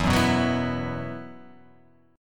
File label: E Suspended 2nd